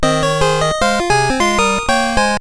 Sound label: Receive Egg; P3D-Legacy